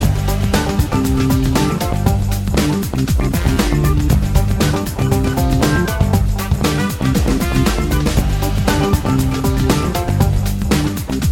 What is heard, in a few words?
Music Generic Menu; calling all mixels; mobile